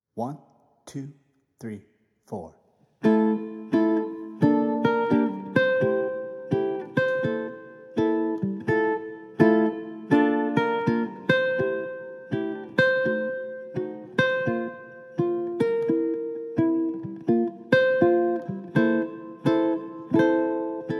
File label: uke